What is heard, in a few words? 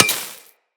Laser Impact Metal